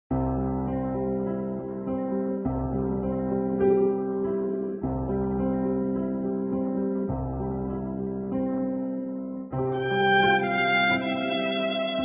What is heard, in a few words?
Violin Cover